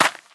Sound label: land ice; Scrat